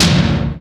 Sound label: Tom M, Roland R8, Toms; Drum Samples